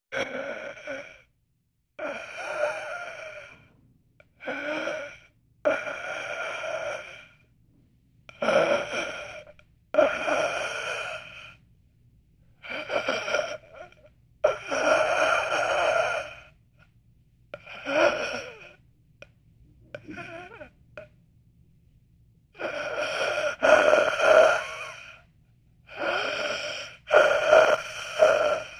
Zombie Growling

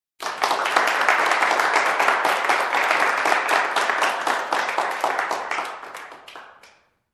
Group Applause

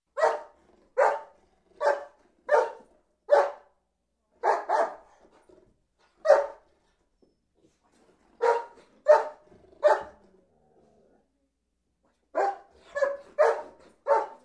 Happy Dog playing and barking in garden

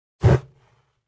Short flamethrower whoosh

